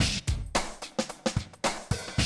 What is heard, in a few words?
110, Classic Drum Loops